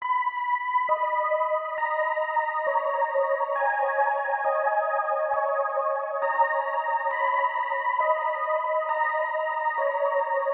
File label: 135 BPM G# Min Prophet 6 Bells; Cymatics; Monarch Melodies; Analog; Levitate